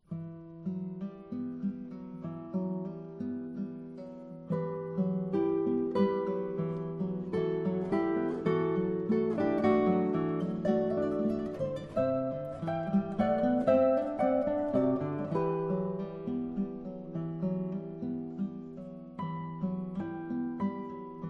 Oriental

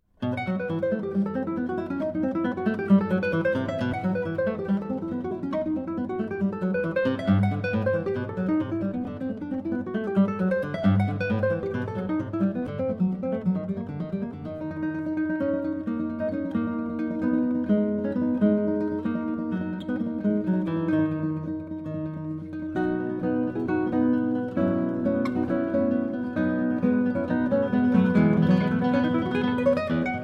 Prelude en Si majeur

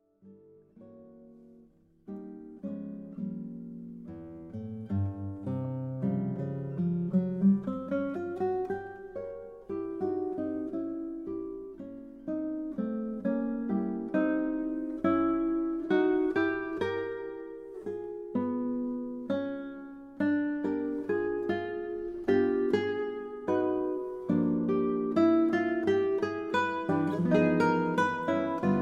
Improvisation Lento

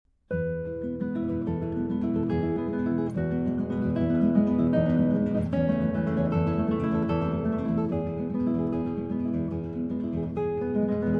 Prelude en mi majeur

